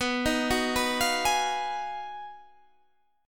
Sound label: Ab Minor 13th, B, guitar chord